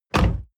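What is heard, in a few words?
sports car exterior door close hard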